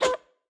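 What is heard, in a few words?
Chicken base attack, 经典及以上形态攻击音效; 母鸡会用自己的喙不断地啄对手; 绿野世界; 卡通农场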